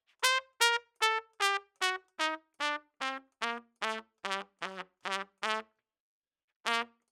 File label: Trump